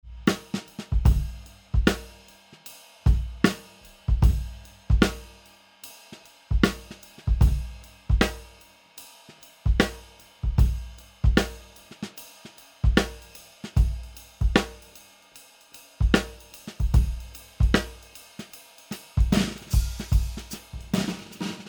jazz grooves; drumdrops; brad webb

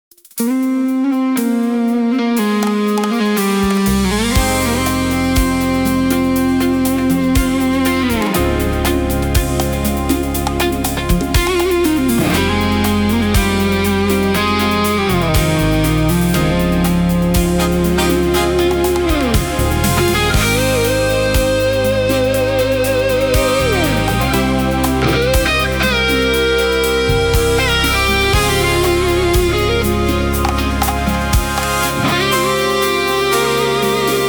instrumental streaming loud; transientik master; transientik labs

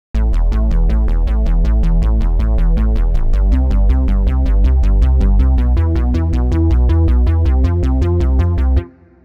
easy bassline; the analog sound waves

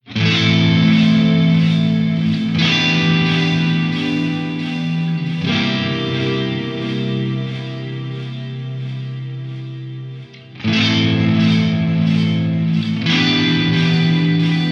big delay, fedzep

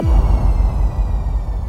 sweep sound

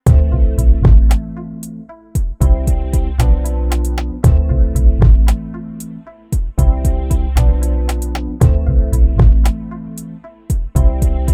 QY100 Dark Soul; urban beats

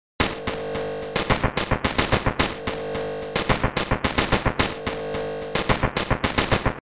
chipbeat

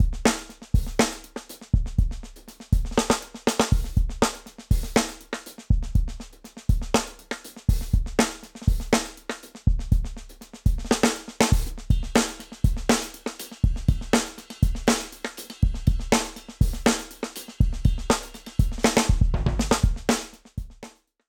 drums 1970s

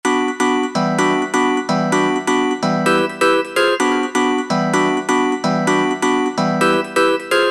multi cloister; the producer school

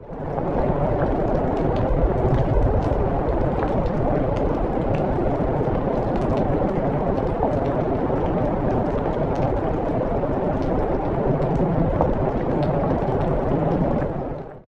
heavy rain short; hi fi; ambience and background fx; nova sound

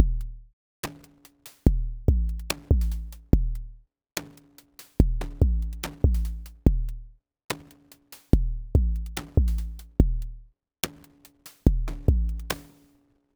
drums

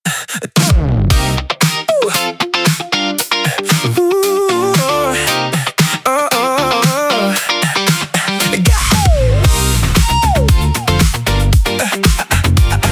pop streaming loud; transientik master; transientik labs

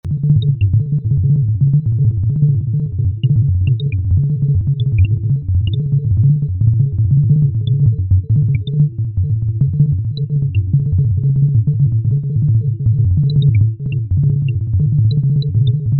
synth bunka

